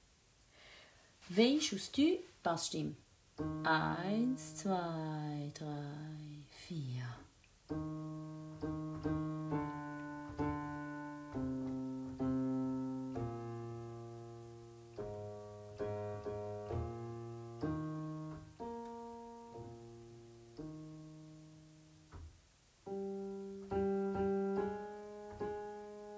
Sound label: Bass